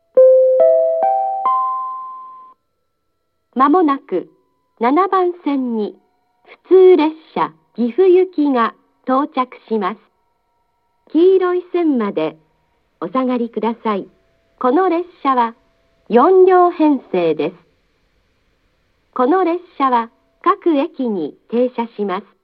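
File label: Toyohashi approach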